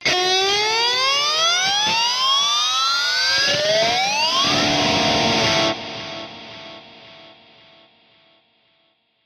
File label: Elect Guitar Scream, electric guitar